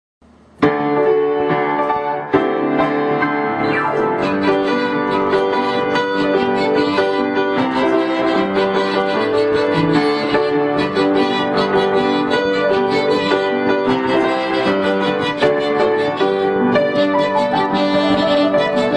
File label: Jingle Bells